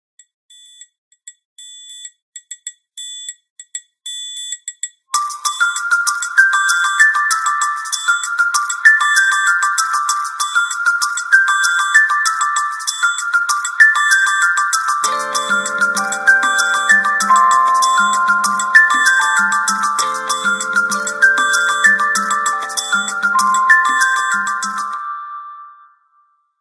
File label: Huawei Mate 30E Pro 5G, ringtone